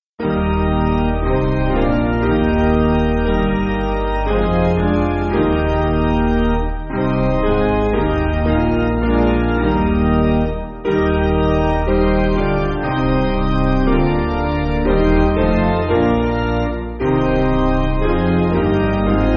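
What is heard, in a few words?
Piano, Backing Files for Churches; Eb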